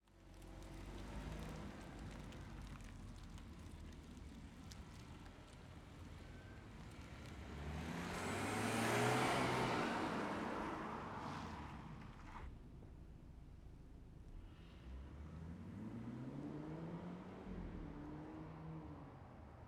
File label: BMW Driving Med Fast Front, BMW 320i; 2014; Secret Source